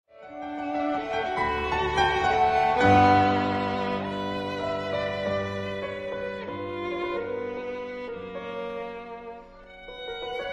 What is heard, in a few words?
Sonata for violin & piano in A major Adagio molto expressivo